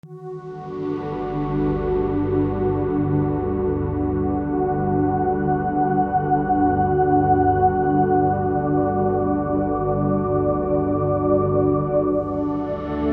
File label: 9hz binaural beats; 9Hz binaural beats and ambient music for soothing r